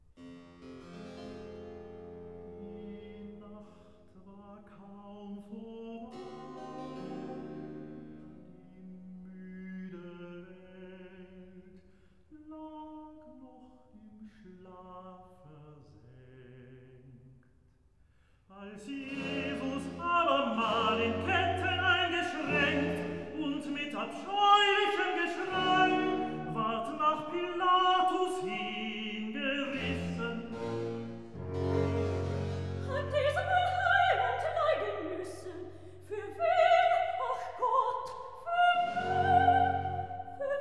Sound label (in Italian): Recitativo; Die Nacht war kaum vorbei; Brockes Passion